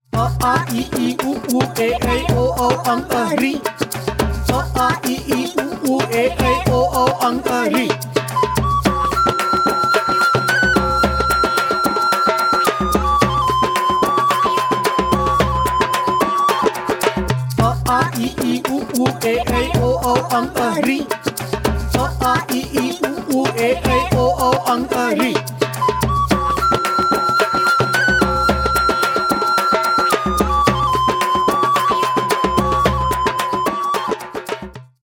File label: Hindi ringtones; Hindi Fun Khel